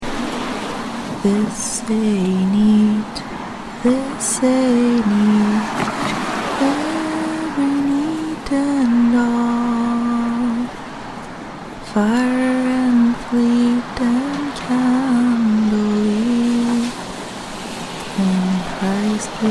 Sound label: F, Casual, Soundscape; Lyke Wake Dirge